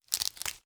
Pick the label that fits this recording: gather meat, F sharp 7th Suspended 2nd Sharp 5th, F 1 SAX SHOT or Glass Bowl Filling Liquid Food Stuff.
gather meat